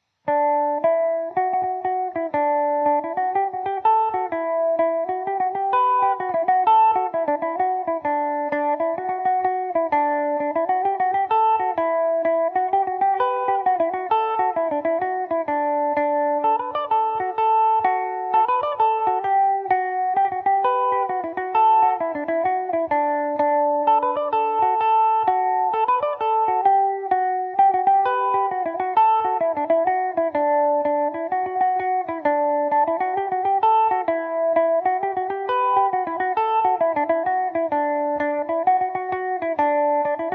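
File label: D major revelation, Irish mandolin; johnny mickeys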